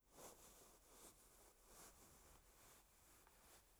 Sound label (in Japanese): 布ズレ, ASMR